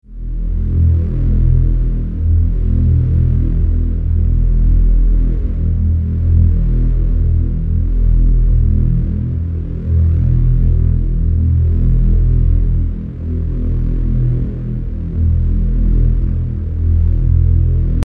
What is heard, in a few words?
Groove